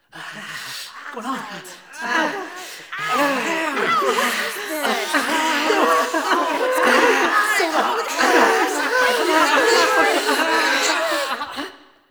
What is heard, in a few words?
Student Pain; Cinematics; Warframe; TheNewWar; Zariman